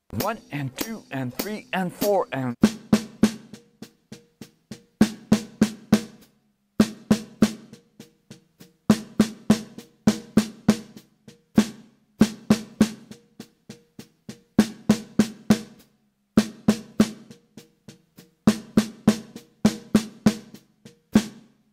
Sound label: Melody Straight 8ths